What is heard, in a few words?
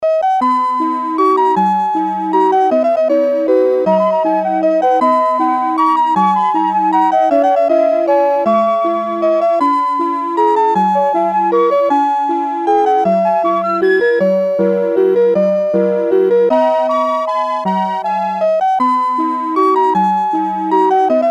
rhythm song, clocks